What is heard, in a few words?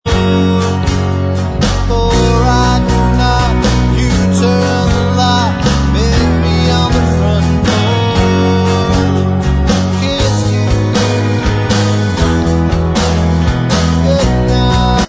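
Bvox Track, backing vocals track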